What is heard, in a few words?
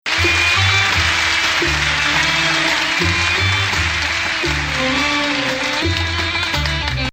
Nahawand